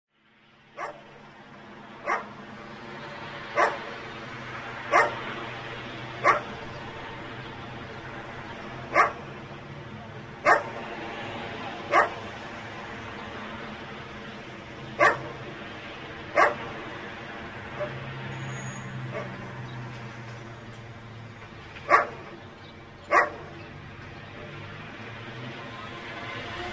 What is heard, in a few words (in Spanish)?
calles av pellegrini perro toreando; Rosario